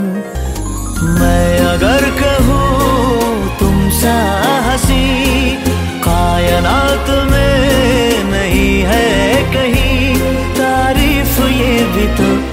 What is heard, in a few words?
main bollywood ringtone